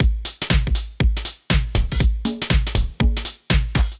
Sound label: Foundation Dance Kit, Drums; Ableton; Factory Packs; Vibrations; ModeAudio